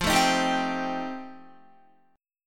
F 9th F Guitar, chord; strummed

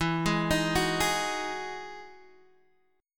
E Major 9th E down Guitar Open G

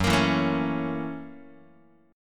F sharp 7th Sharp 9th, Guitar; strummed; JGuitar

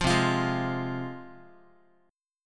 C Suspended 4th Sharp 5th, C, down, Guitar; Csus4#5 strummed; JGuitar

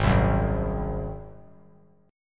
Ab 7th Ab down; Ab7 strummed; JGuitar

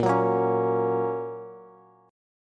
A sharp Minor Sharp 5th, down, Bass

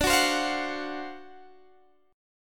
D 7th Sharp 9th, Guitar Open G

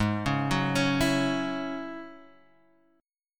Ab 7th Suspended 4th Sharp 5th Ab down; Ab7sus4#5 arpeggiated

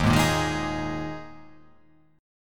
Eb Suspended 4th Eb, Ebsus4 chord; Ebsus4 strummed; JGuitar

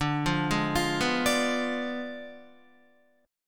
D Diminished 7th D down Guitar; arpeggiated

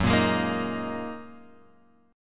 Gb 6th Add 9th Gb down Guitar Open G

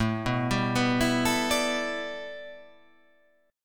A 7th Suspended 2nd Suspended 4th

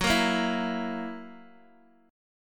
Gb 7th Gb down Guitar Open D, chord

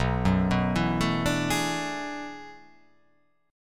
B Minor 13th, chord; Bm13 arpeggiated; JGuitar

